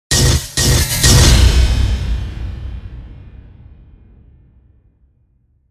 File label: Impacts Combo; Gothic Storm Music; Advanced Sound Design